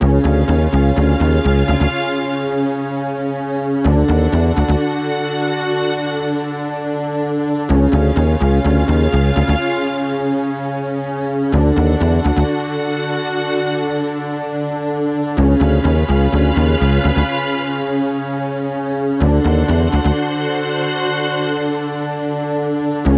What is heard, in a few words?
Tension; Super Metroid